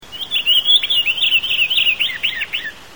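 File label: shchur voice, pine grosbeak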